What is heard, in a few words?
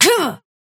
effort melee small